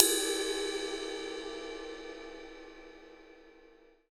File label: RIDE 20 EDGE, Drums; Big Fish Audio; Ross Garfield Drums VOL-2; TUCSON KIT